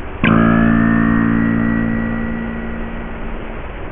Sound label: Common Minke Whale boing